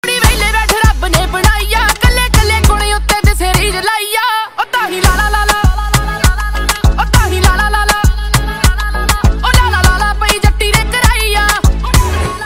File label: ringtone